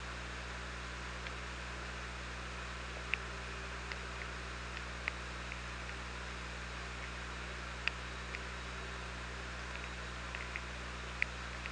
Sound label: OrcaLab